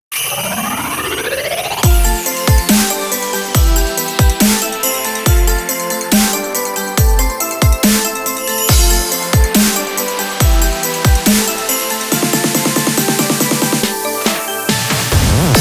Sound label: off vocal; 東方紅楼夢21